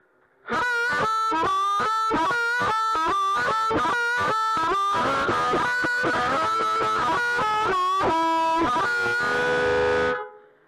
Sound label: high gain